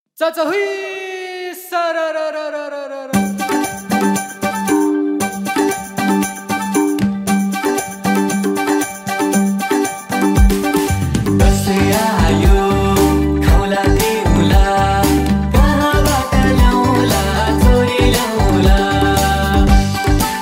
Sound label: Children Song